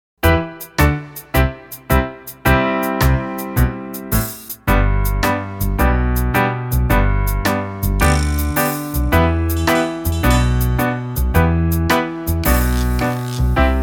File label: Pizzicato Arco